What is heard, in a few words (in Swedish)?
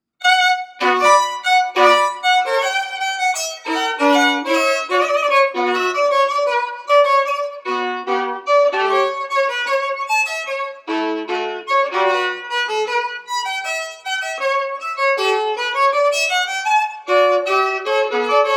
Akustisk loop